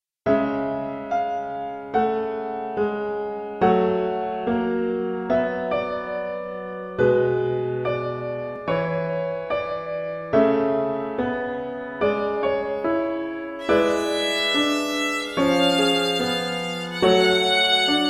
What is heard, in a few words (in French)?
Alterations, Violon et Piano